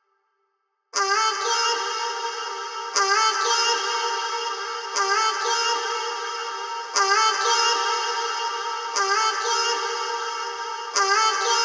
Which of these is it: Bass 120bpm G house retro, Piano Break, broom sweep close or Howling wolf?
Bass 120bpm G house retro